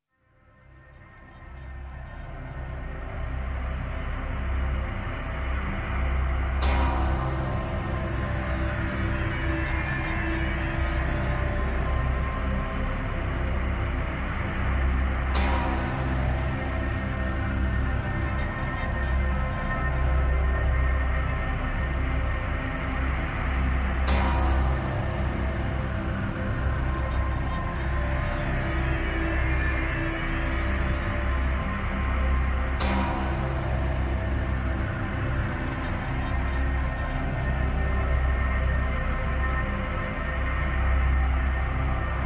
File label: Drones Only